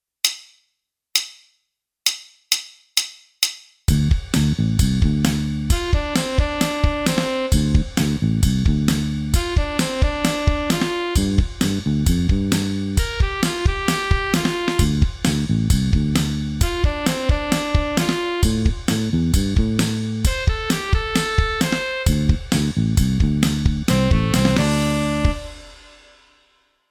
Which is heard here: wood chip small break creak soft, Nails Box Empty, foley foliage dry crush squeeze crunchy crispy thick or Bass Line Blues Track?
Bass Line Blues Track